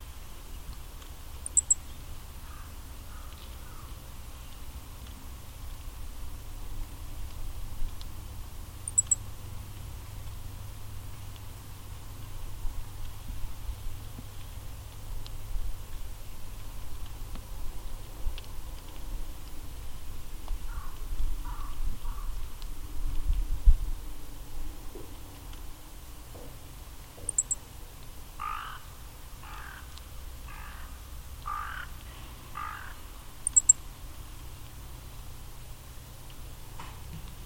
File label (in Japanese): hoojiro; akasaka